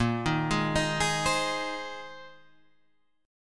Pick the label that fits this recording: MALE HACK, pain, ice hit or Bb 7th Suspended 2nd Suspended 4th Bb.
Bb 7th Suspended 2nd Suspended 4th Bb